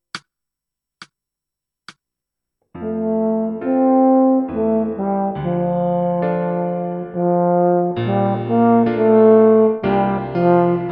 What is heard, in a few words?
Tuba